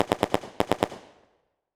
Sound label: Weapon Machine Gun World War II Historical Double Burst Distant; AdobeCC